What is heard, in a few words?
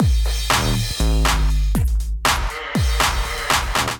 Tonal Kit, drums; BayTonal Kit; Ableton; Factory Packs; Skitter and Step